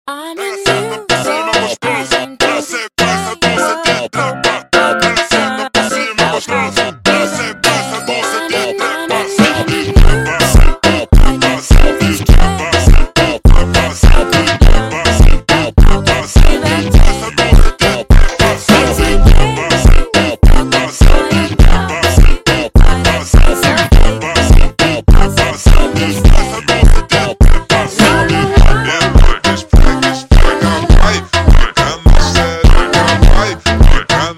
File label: New Soul Funk ultra Slowed